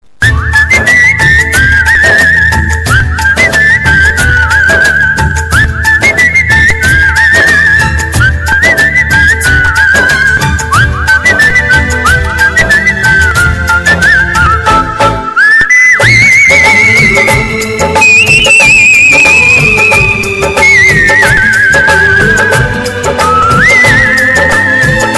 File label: Whissel